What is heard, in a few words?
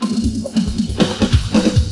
Tom